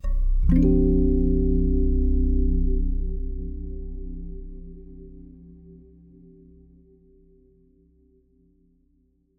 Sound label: pseudo pulsar; ゆめ2っき